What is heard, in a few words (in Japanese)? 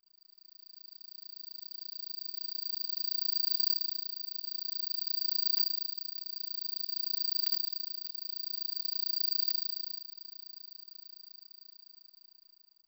suzumushi